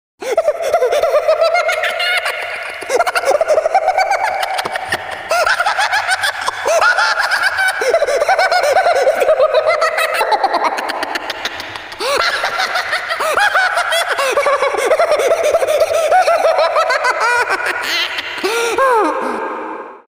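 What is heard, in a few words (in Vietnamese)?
Tiếng chú hề cười điên dại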